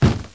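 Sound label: plastic bag; InventorLabs - Transportation; roadrace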